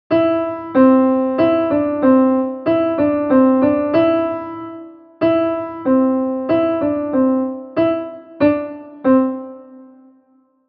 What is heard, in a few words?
piano only